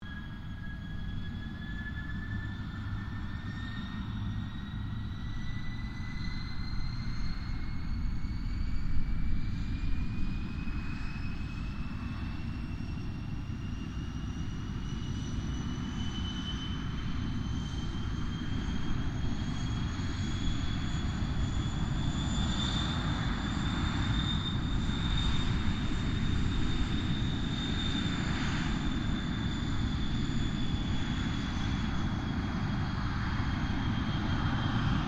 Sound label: AIRCRAFT GLOSTER METEOR F MK8 TAXI DISTANT; Gloster Meteor figter jet; Airplanes; Vehicles